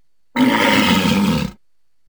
walrus attack; arctic life

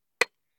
carve; jonez

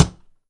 node dug; logistica

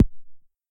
dig oddly breakable by hand; minetest classic

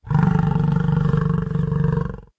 buffalo; indianvillage mobs; Native American Village

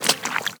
mangrove mud; australia

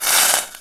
radiant damage sizzle

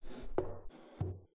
drawers interact

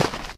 sand footstep; minetestforfun game

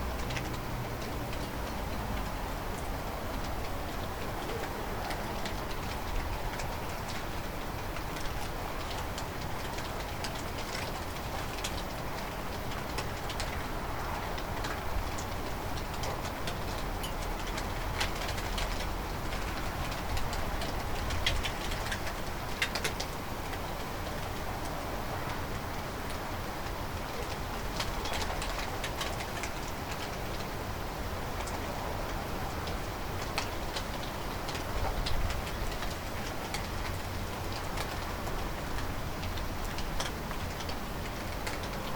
rain; climatez

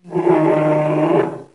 polar bear attack; petz

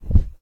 drop bag; prestibags

